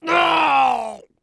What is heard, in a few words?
Die